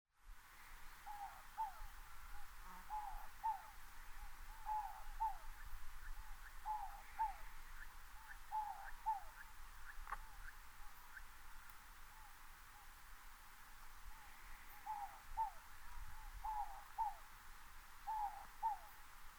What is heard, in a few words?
Inca Dove